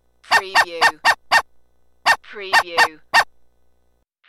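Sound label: Yappy Dog Barking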